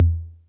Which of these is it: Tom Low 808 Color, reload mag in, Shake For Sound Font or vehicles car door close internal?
Tom Low 808 Color